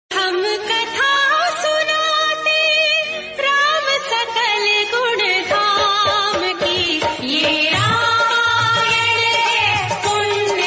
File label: Bhakti Song Ringtone